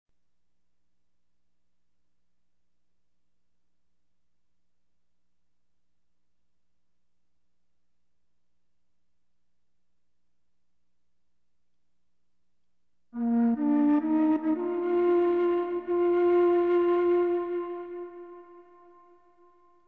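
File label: melody; Myst III Exile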